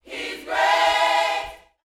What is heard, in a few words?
Vocal, Gospel, PHRASE; Spectrasonics